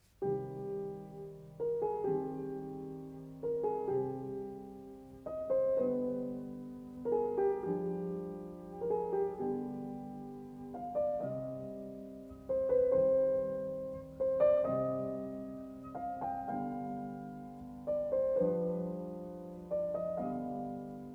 Variations in C major, Adagio, ma non troppo, Piano; Beethoven; Diabelli Variations; op.120; Brilliant Complete Beethoven Edition; The Piano Collection